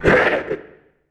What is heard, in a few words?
Magma Magician hit; IgnisVolcano